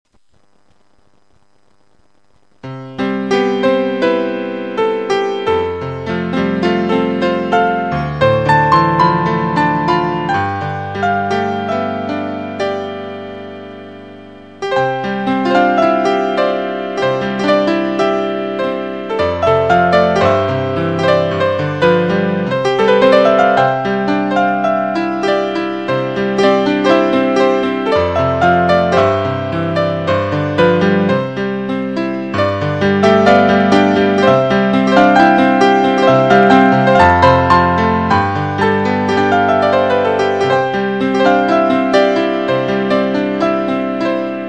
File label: Christmas Piano Deck The Halls